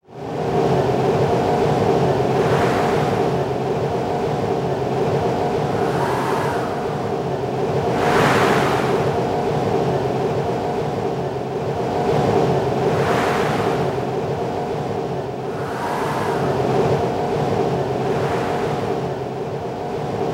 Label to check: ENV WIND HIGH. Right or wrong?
Right